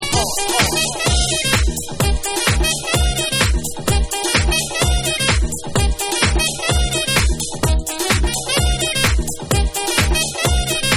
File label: PLAY THOSE HORNS